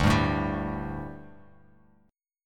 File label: D Suspended 4th down Bass Standard; strummed